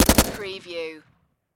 Machine Gun SIG SG 552 Commando Short Burst Close Perspective